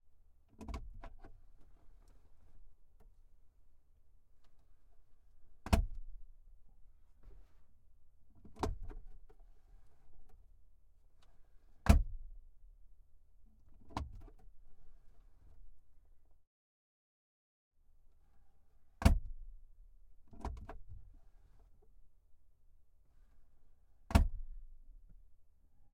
Volvo, XC90, Hybrid, Console Box Open and Close, Interior; MKH8020; 2018 Electric; Pole Position